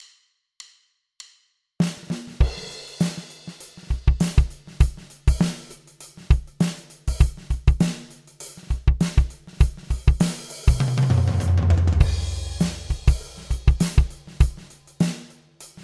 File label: pocket shuffled accents